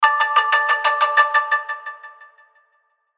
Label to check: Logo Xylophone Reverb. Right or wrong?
Right